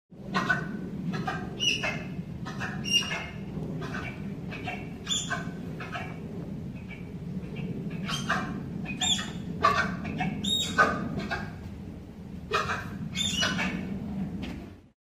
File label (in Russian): tapir, zvuk tapira